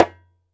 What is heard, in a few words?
ASHIKO, African Perc; E-MU Producer Series Vol. 7 – Old World Instruments